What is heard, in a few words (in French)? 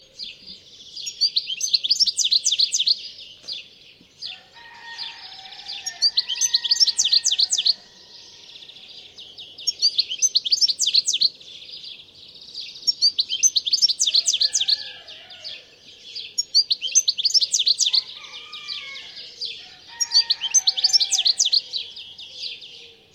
Chardonneret elegant